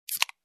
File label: Soda can opening